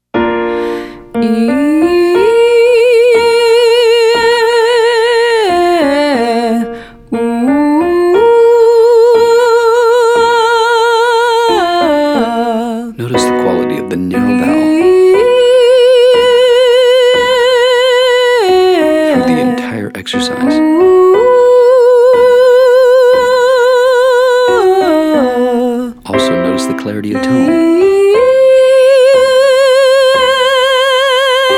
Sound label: Exercise 5 vowel changes female